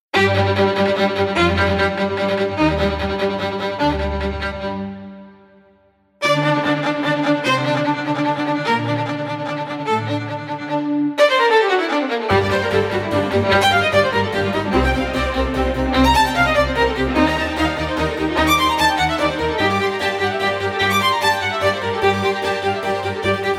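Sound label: Estate Presto Orchestra; sViol + Harpsi; Vivaldi's Summer concerto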